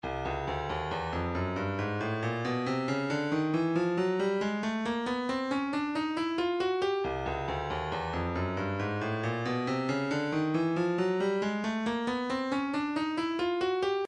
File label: electronic hihat; 137 Like Dat